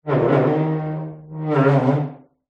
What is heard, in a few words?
household dining chair wood slide laminate floor distant room reverb; zapsplat